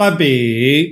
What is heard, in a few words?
bing